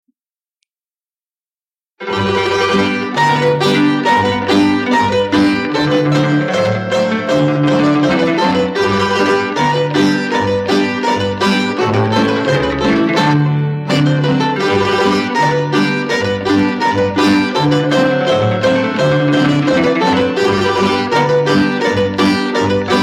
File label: Serbia Ersko Kolo, Folk Dances; Petaluma; 2nd String Folk Dances; Serbian